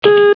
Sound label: Key Press